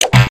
illegal placement; build mode

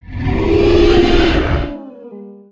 howl; creatures organic

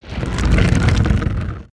Pillar Crumble; ambience

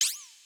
Frog Scared; creatures organic

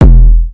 Pet Thud Large; creatures pets

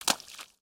Foot Swamp Water Run; footsteps

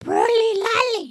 train sit, emotes

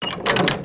Tiki Torch Short Shake

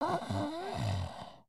VO sleep, creatures organic

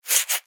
Owl Scratching; Owl Backpack; aronwk collection; ndaudio; creatures organic

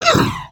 Run, creatures organic

